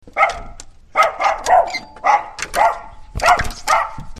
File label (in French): chien gratte